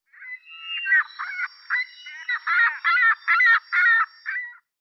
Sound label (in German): Ringschnabelmoewe Larus delawarensis